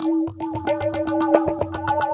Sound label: TABLAS; Zero-G; Chemical Beats